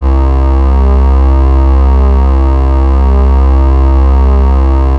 Ground, Industrial

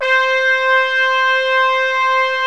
SYMPHONIC BRS

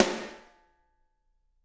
SD, Drums; Bob Clearmountain Drums I

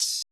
cymbal, Roland MC-909

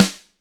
GRCH HI, GRETSCH SNR; ILIO; Double Platinum Drums 1

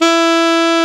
SAX A. MP E, Alto Short; Roland LCDP07 Super Sax

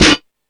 Crash, Drum Machines E-MU Pro-Cussion

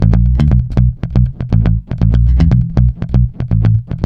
B, groover, bass; Spectrasonics; Bass Legends

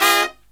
HI CHORD, Brass

